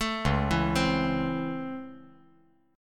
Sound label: Db Minor Major 7th Sharp 5th Db; DbmM7#5 arpeggiated; JGuitar